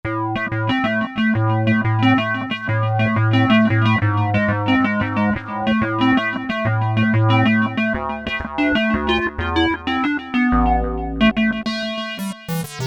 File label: MS-101 Sqr, Korg MS2000; Factory Patches